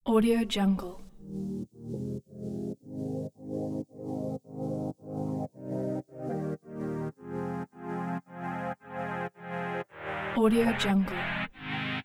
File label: uplifting vlog house